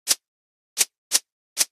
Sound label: lighter mono